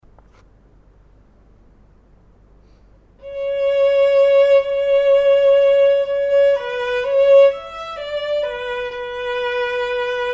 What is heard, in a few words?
perf vln